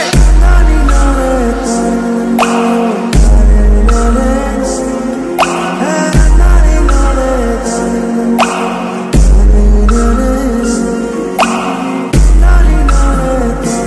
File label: DJ Ringtone; ringtones